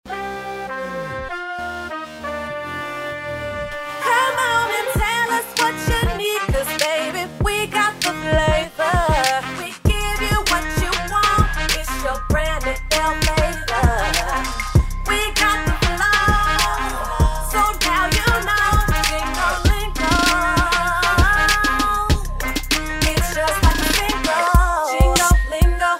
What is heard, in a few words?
Jingle; Jingle Lingo